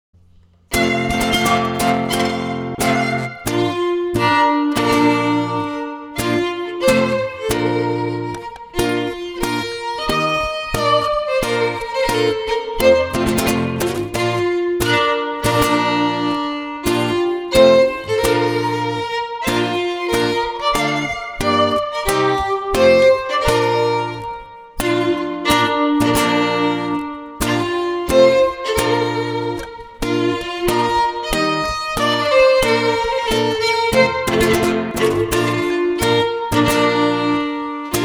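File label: Bridal Chorus Violin Guitar; Acoustic Duo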